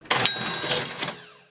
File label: Cash register